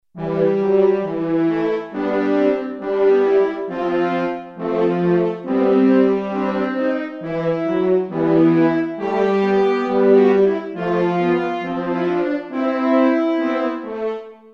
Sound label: brass, Roland JV2080; Brass: atom